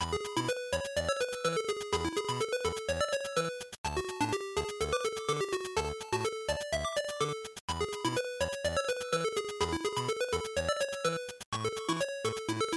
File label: General Result Loop, bgm